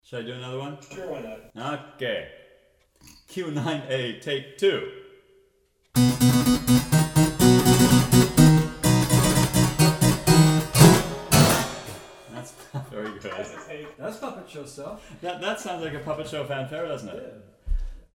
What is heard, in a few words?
funfare